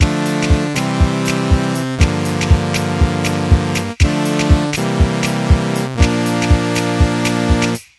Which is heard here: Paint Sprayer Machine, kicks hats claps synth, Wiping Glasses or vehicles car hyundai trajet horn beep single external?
kicks hats claps synth